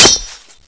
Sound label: knife hit wall